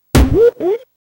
jumping sound rpg game

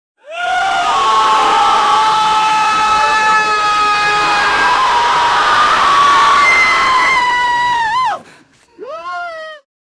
a family all screaming, overlapping voices

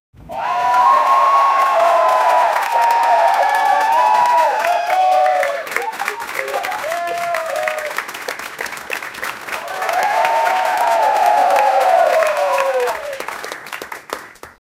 applause of people cheerin; audience

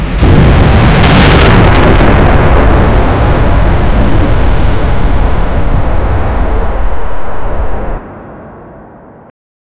space

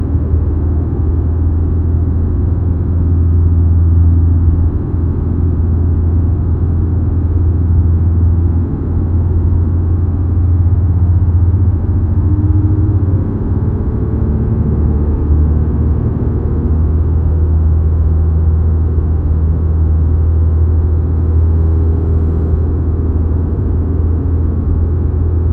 sound of a drive on, automobile

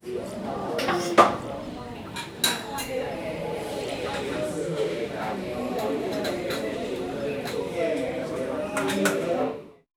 coffeeshop ambiance

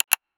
user interface

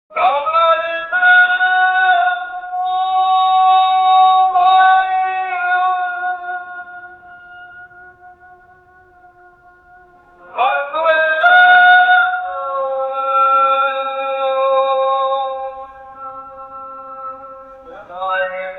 islamic call to worship, adhan

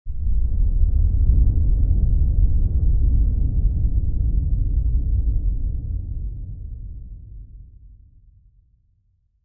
low rumble of thunder dis; atmospheric

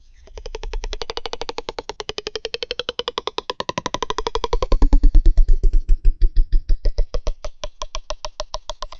cartoon spring bouncing and stretching

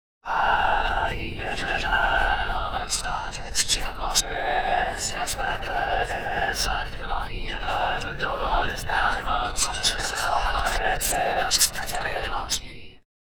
ghostly murmurs arcane

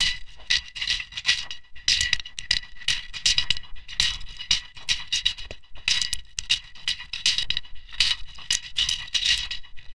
rhythmic wet slime

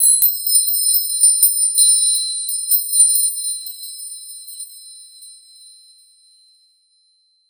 two tone chime with brigh, playful

